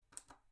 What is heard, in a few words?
cherry mx blue; wahoo